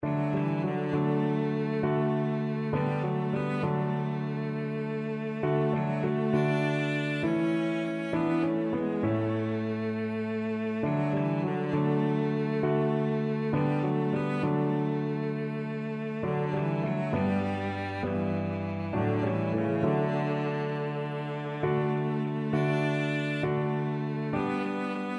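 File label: violoncello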